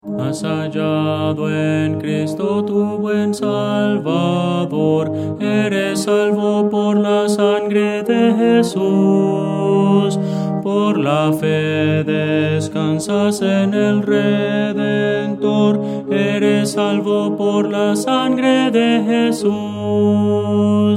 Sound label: tenor; Voces para coro